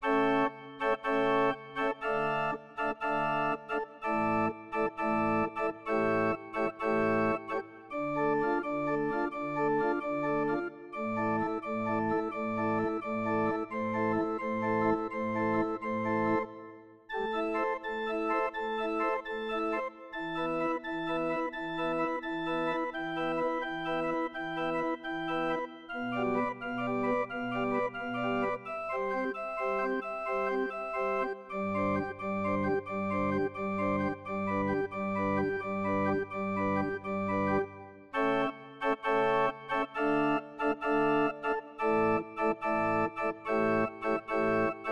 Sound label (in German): Orgelkonzert